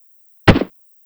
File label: land; Pinocchio 1995 SNES; gamesoundopedia